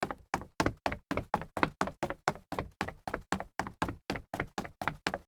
sneaker stairs run wood, foley

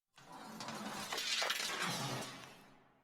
crash metal sliding on metal

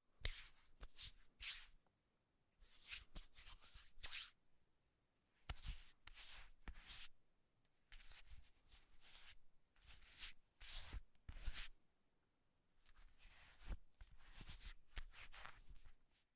hand wipe

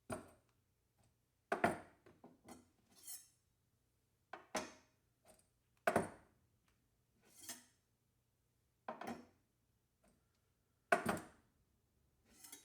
spatula down knife up

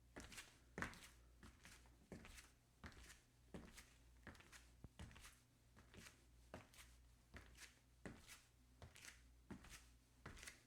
flip flops on wood

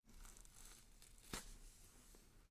puncture pop

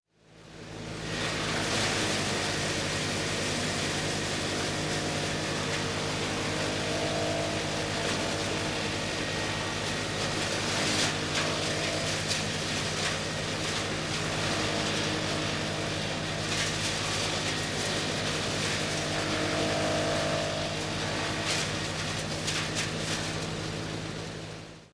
wood chipper

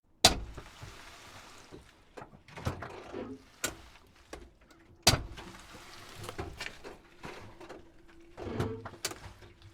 gear shifter

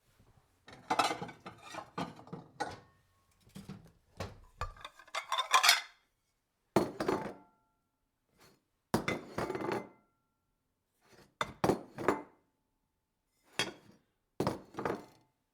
bowls on table, household

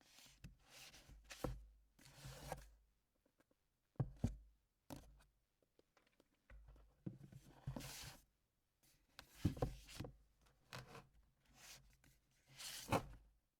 desk organization

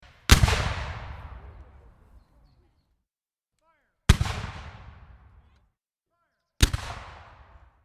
howitzer cannon fire

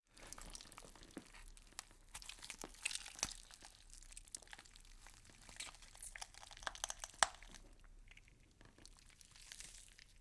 wet meat twist

